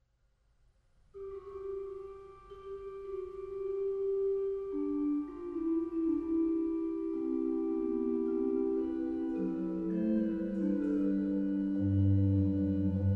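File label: orgue; Johann Sebastian Bach; Herr Jesu Christ dich zu uns wend; BWV 709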